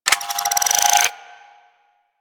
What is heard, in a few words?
Rifle Shot Secondary Charge; Weapons; Warframe; Tenno; Bard Rifle